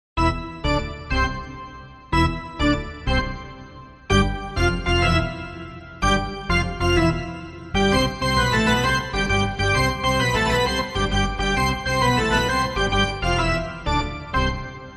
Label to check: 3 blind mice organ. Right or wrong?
Right